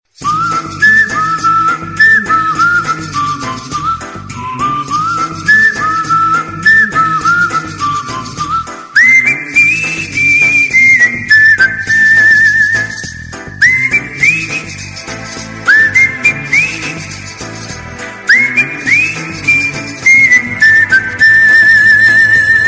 New Flute Ringtone for Mobile; ringtones

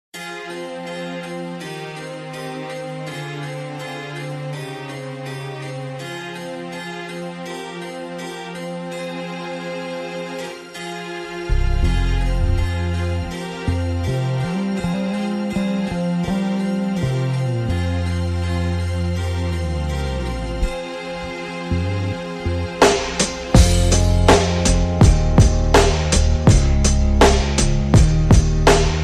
English Ringtone

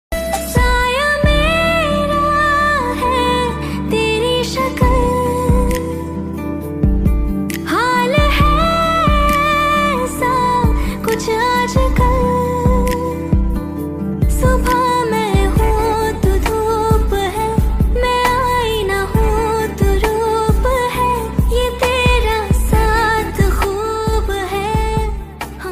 Female Version Ringtone

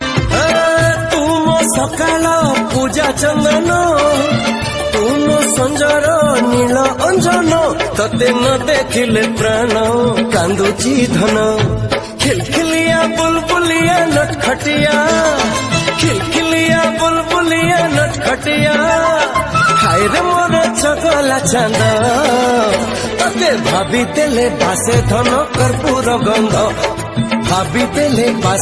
Odia jagannath Bhajan Song Ringtone

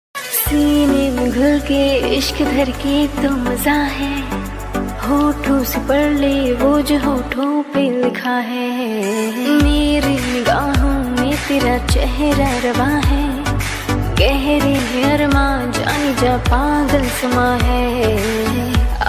New Romantic Hindi Ringtone

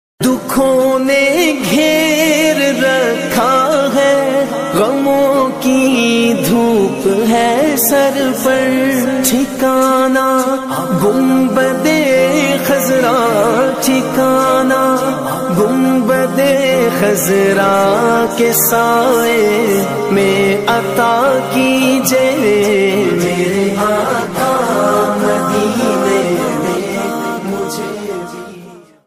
Islamic Naat Ringtone

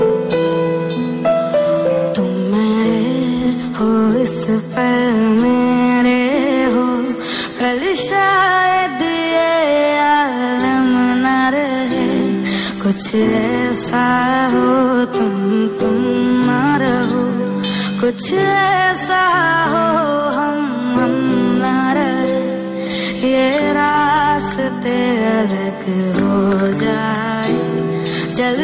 Hindi Song Ringtone

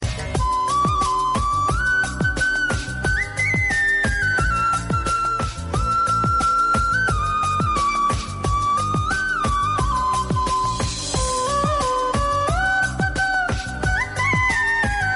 Tamil Love Melody Ringtone; ringtones

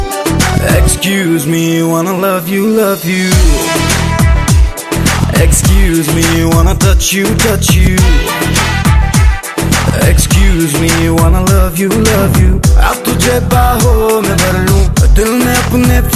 Hindi Song Ringtone

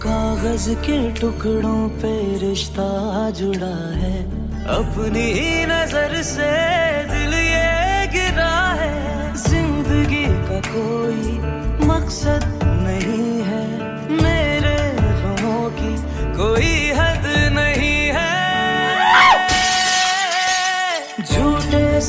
New Mood Off Ringtoen

